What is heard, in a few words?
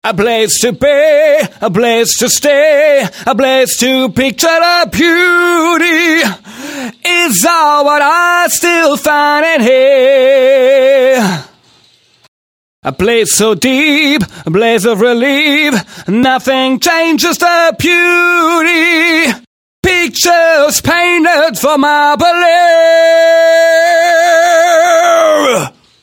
Vocal Dry